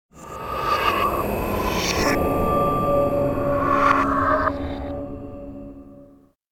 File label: Logo; GT Interactive Software